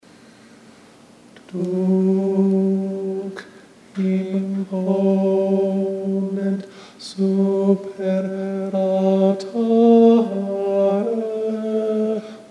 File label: second soprano; Allegri last page chorus Soprano 2; Lent/Holy Week 2013 Rehearsal recordings